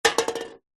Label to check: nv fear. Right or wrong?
Wrong